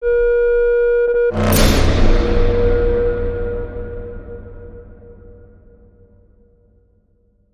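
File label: Hit Horror Negative Feedback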